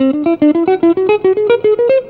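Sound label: electric guitar melody; Best Service; World Colours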